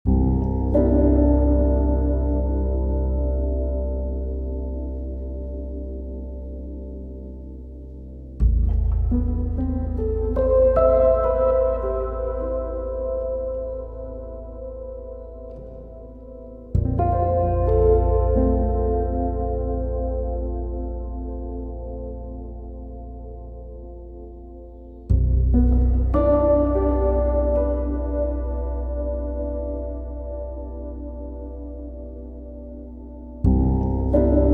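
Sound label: piano ambient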